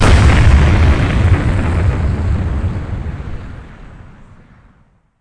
BLAST; ImpGal2; spacewar destructions

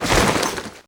impact

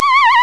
WIERD

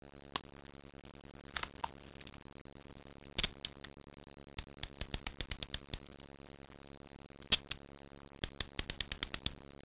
cuffs, police; disaster

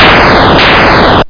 blaster

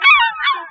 SEAGULL; Ambient; PC Gamer IT CD 46

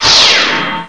Bonus Multiplier; Swoop

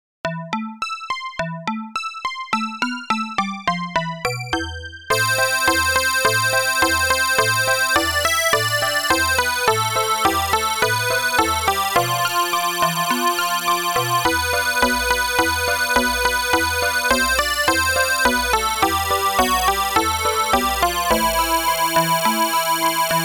TIC TOCK

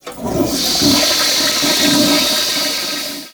closet flush; Singles Flirt Up Your Life

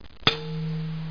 ding; Monster Media Number 15; os2

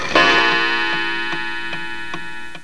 Bell - One o'clock; Olduvai Sounds

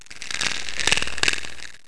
SCURRY

